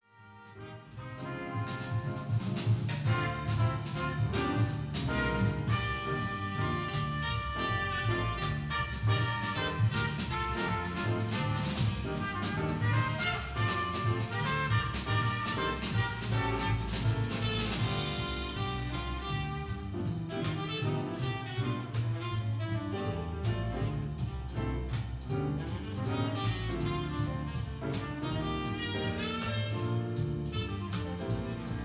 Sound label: Jazz; Usen; Fist of the North Star Lost Paradise; Hokuto ga Gotoku; PlayStation 4 gamerip